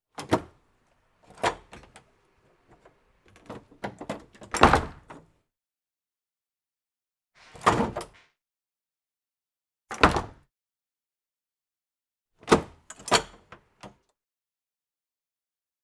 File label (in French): PORTE BAR BOIS POUSSE ISSUE DE SECOURS OUVERTURE FERMETURE